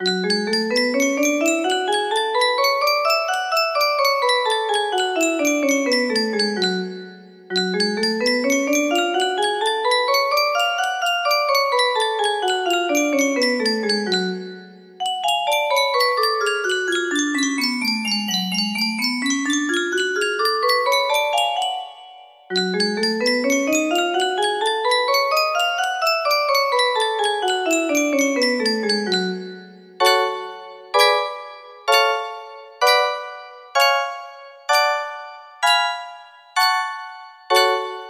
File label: f sharp minor, music box